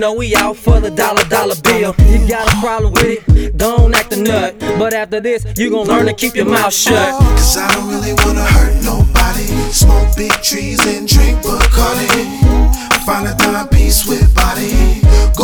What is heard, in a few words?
OOOOOH